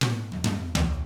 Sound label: FUNKY, Drums; ILIO; Double Platinum Drums 1; 99 FUNKY 2